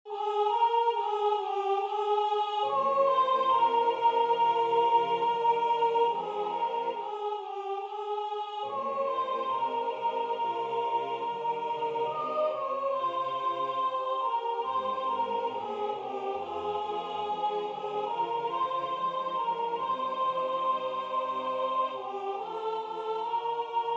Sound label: Soprano, chorale; Ave Maria; Aymé Kunc